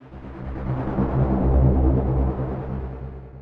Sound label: Creeping Monster